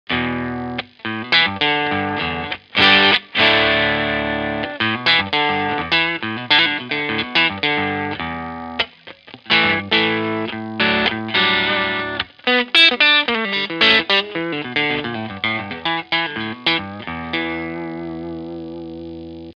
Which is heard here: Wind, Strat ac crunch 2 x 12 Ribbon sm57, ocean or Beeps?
Strat ac crunch 2 x 12 Ribbon sm57